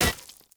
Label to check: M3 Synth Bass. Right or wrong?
Wrong